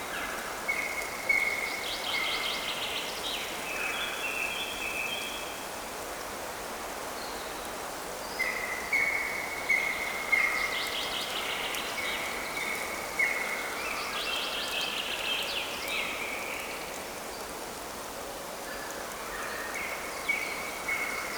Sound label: Wetland Forest Day Amb Loop